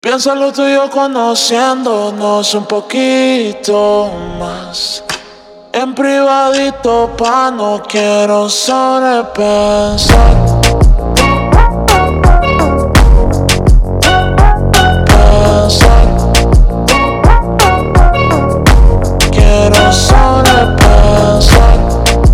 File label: Phonk MONTAGEM Super Slowed